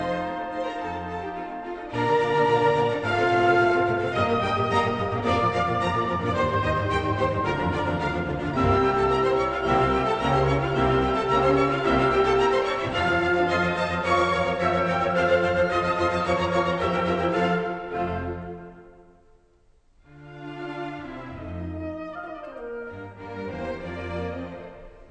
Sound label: classical